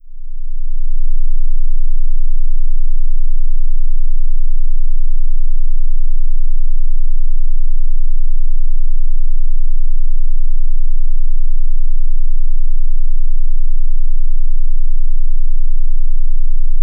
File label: Sinewave 15Hz -2dB; 30s; Sinuston 20Hz -2dB - 30s / Wie 15Hz